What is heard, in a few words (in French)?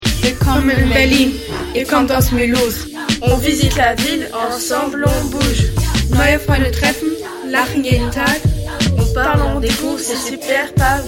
rap franco allemand; Echange Mulhouse; Hört hier in unseren selbst gestalteten Rap rein.; échange scolaire